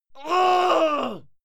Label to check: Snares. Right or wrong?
Wrong